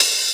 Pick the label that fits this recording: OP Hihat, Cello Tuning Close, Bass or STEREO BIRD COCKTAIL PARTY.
OP Hihat